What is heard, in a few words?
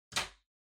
Lighter Far Put